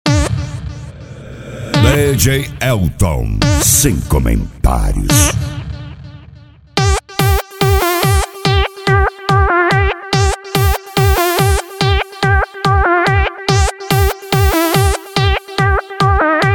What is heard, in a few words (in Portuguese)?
eletro car automotivo